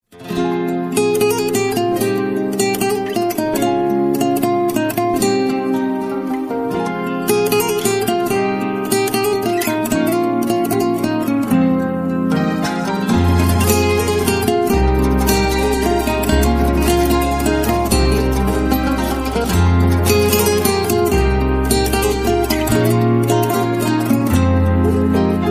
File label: nice guitar ringtone